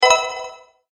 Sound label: multimedia rollover, Multimedia Rollover Tone